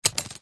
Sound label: foley cowboy leather belt holster short grab handle movement; zapsplat